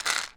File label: Vitamin B Roll, pill bottles